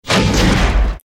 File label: destruction body impact